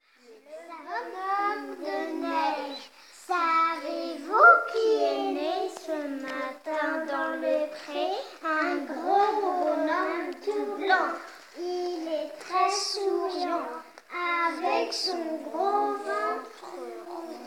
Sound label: Vocal